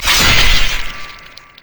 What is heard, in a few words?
crit attack; LoL; yasuo base